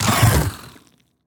biter death, creatures

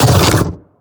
biter roar big, creatures

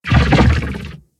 spitter call big, creatures

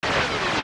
robot die vox; fight

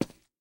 rails, walking; Factorio

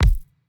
build large; Factorio

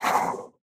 biter call, creatures

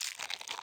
spitter walk, creatures

